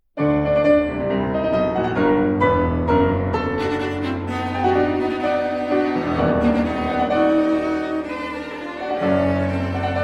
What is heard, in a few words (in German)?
Klaviertrio, Klaviertrio Violine, Violoncello & Klavier; Klaviertrio 1. Satz; Erlanger Klaviertrio